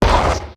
giant demoman step; Garry's Mod; tf2; mvm

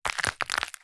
step; tungtung cursed; tungtung sahur curse

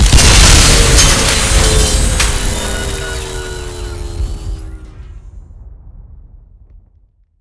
robot explosion; Garry's Mod; tf2

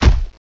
run step, footsteps; vinagre npcpack

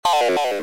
death, snake; Garry's Mod; hungry snake

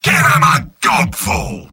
battle cry; sniper; mvm

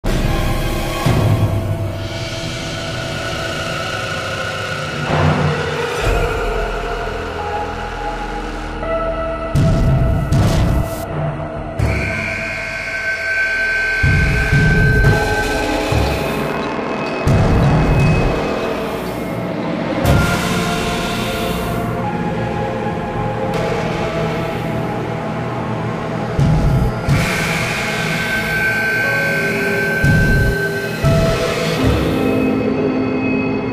panic room loop, music; catnap

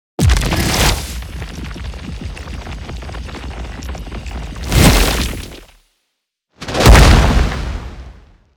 jumpscare; earth

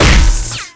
neon sign hit, weapons; tf2

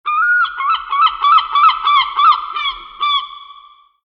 seagull; Garry's Mod; tf2; mvm warehouse

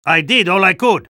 spy jeers; vo

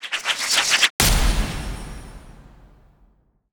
medal spin hit

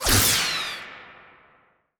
resistance heavy; Garry's Mod; tf2

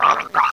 drown; Garry's Mod; tf2

